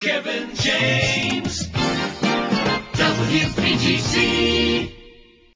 jingles logoset; priority one

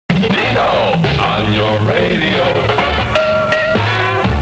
jock jingle

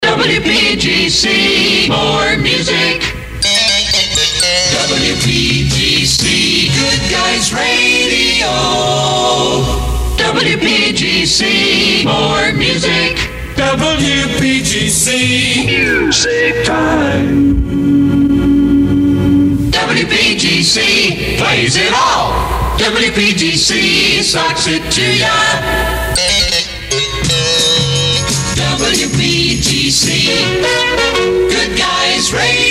jingles; 1968; pepper tanner; the now sound

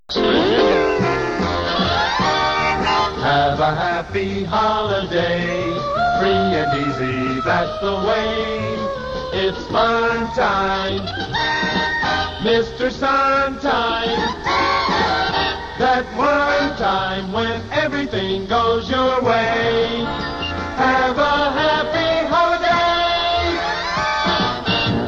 jingles generic happy holiday

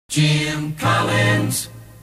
jock jingles; pams series 42a

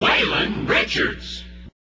jingles shout; positron; waylon richards

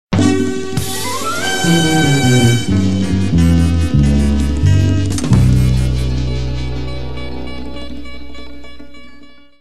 jingles image pack remix slow band track

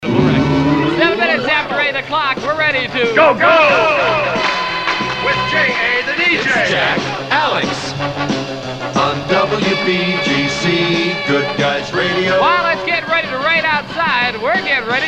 jock jingles; 1966; spot productions; jack alix

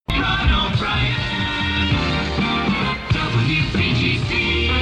jingles logoset; priority one; ron obrien

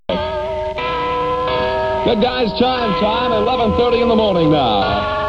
chime time; 1966

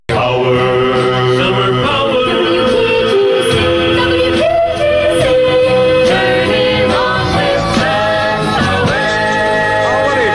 jingles summer power

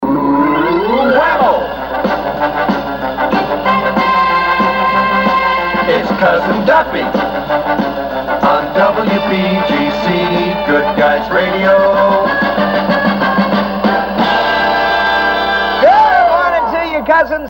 jock jingles; 1966; spot productions

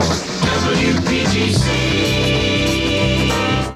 jingles; drake aa image pack originals

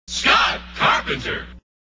jingles shout; positron

